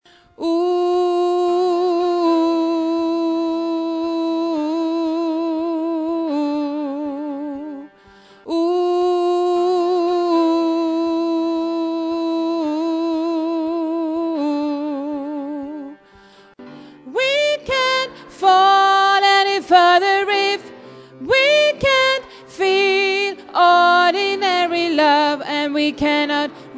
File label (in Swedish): sopran